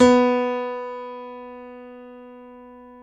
PNO Grand Pno; Club-50 - Foundations Roland

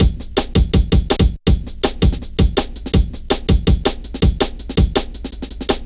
Drum Loops, Jungle

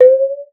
water; Xsample VOL-9

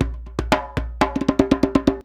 119 DJEMBE, Modern Percussion Loops

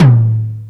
DMX, Oberheim Dmx, Drums

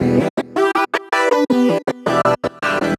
80 Fm, Melodic Loops; M8; Electronisounds

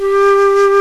HARPSICORD

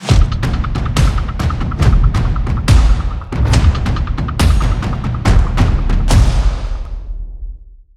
Processed War Drums, percussion and foley; M8

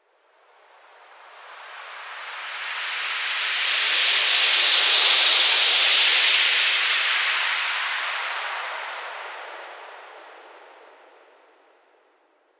Breakdown FX, Underground UK House & Electro

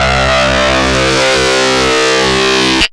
HARDSYNC C2, lead synth; Best Service Alpha Dance vol.2

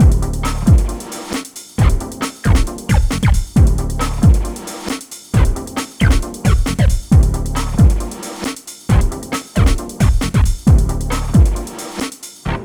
Hardcore Breakbeat, 135 Dmin; Big Fish Audio; Hardcore Breakbeat Action; Gimme Soul

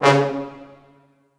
C#2 L, Orchestral Brass

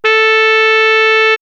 Sax; X-Static Goldmine; backtothe70's

